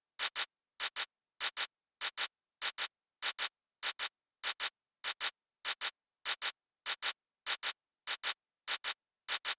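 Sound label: Hip Hop Shaker, 099 bpm; Percussion; Digidesign; Pro Tools; Pro Tools Essential Loops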